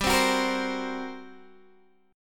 G Minor Major 7th Double Flat 5th, G, Guitar, Open D